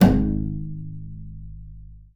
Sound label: BING G#1, BASS FINGER; Xsample VOL-5